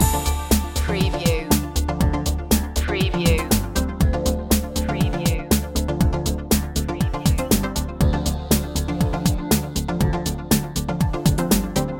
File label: analogica loop, chill